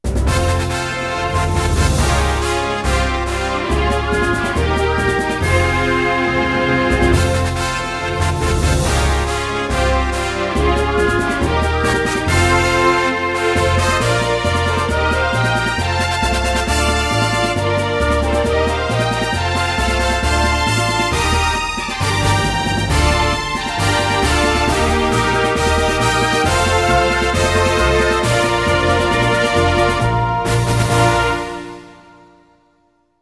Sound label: Mission Complete; PSX rip